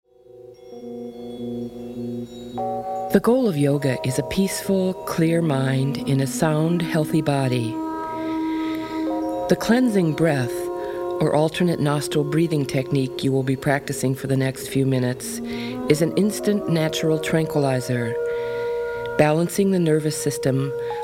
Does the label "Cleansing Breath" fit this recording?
Yes